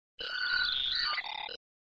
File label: Sound SFX, flipper